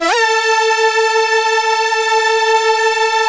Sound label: lead synth